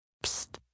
Psst, 1 child, short, human voice, kid, Whispering; speak, talk; Sound Effects:Voice; VoiceBox